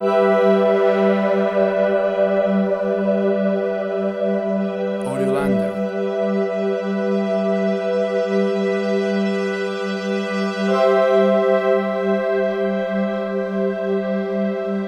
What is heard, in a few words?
Beautiful Ambience